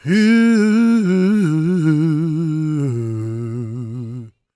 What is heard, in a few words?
GOSP MALE, SOLO; Spectrasonics; Vocal Planet